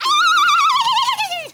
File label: FEMALE RAVE; Spectrasonics; Heart of Africa